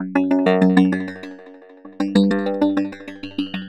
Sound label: Stutter, Underground UK House & Electro, Synths; Sonic Foundry (Sony Creative Software)